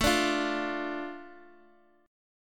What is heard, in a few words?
A Suspended 4th Sharp 5th down Guitar DADGAD